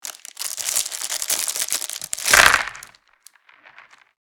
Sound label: board game pieces dropping into board; Monopoly